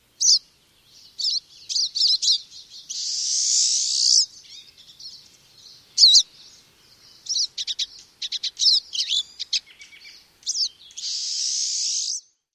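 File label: calls, Pine Siskin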